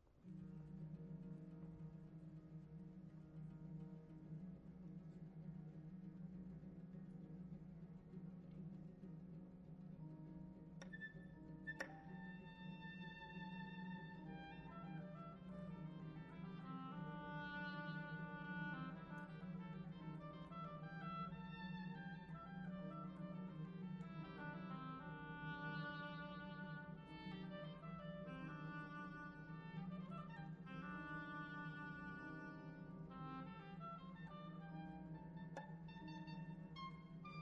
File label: Oboe solo; Stravinsky Rossignol